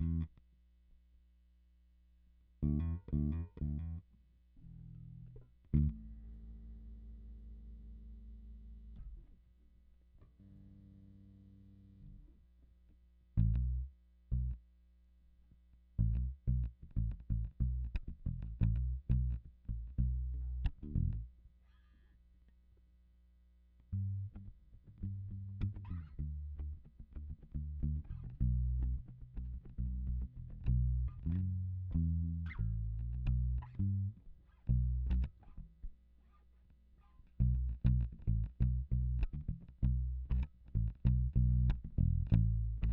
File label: Bass